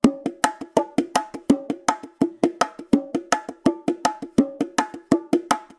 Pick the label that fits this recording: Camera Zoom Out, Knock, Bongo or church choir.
Bongo